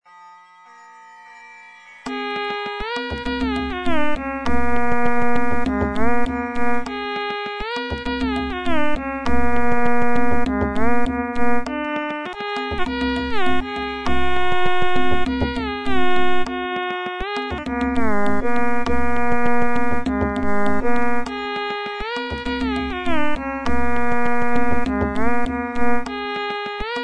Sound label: Folk Tune